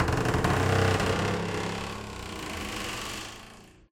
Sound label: door creak; ProSoundCollection v1 3